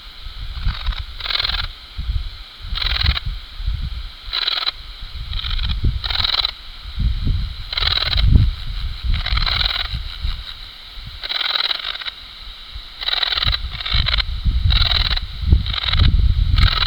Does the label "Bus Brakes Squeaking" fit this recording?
No